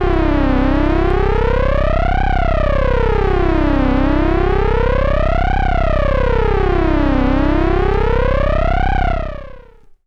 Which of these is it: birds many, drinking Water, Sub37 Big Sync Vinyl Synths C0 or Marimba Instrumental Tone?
Sub37 Big Sync Vinyl Synths C0